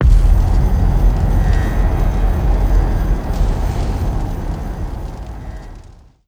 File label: burning city; Stellaris; nemesis